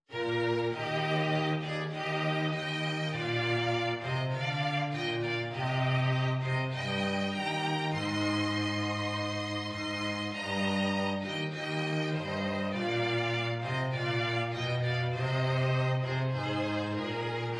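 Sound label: Auld Lang Syne violin violin cello; Christmas; trios for the december holidays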